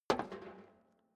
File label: step boots metal rattle single right; schritte; Footsteps